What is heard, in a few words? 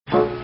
Slinky beep; Desktop Studio 2000